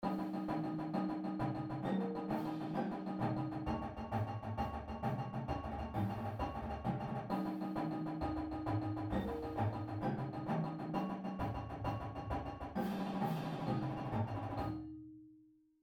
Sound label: Accents Flams Drags Fives, Drumline Exercise; ensemble; pdlmusic; Drumline Ensemble Series